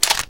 mag out; weapons

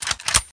sg552 bolt pull; weapons

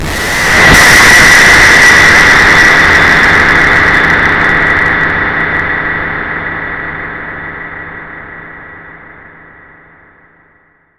supersonic, explosions

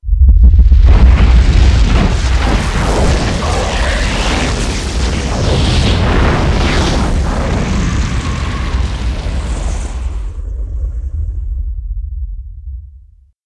nuke rumbling

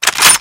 awp bolt, weapons

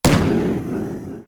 smoke grenade; explosions; light bomb

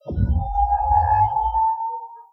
nuke init

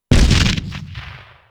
small explosion, light bomb

